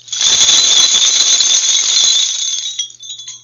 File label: mirror belt coins